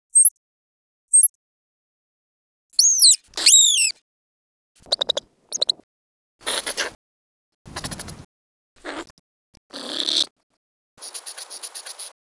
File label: Spermophilus citellus repertoire